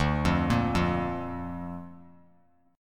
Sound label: D Minor Sharp 5th D down